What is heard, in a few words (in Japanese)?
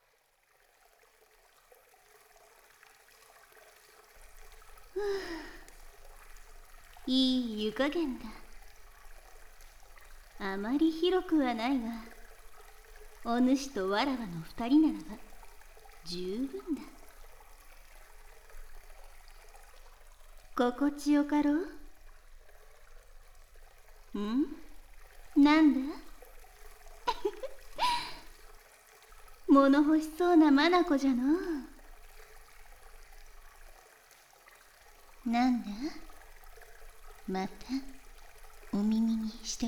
風呂・両耳キス, ASMR